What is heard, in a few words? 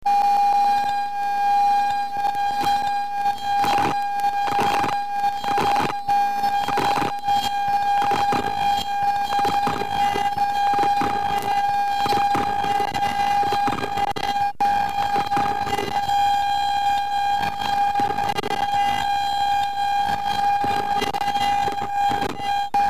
drones